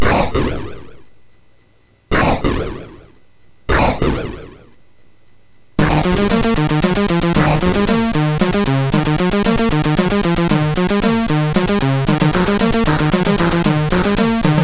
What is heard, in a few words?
Thunder, MOS6581R3; Kwa-Boom Thunder; hvsc